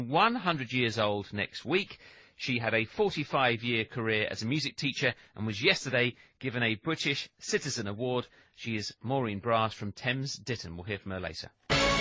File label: Brass